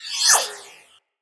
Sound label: Swarm Cannon Projectile By; Weapons; Corpus